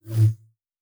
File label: Light Saber; Sci-Fi Sounds